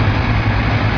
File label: Dr14 run; finnish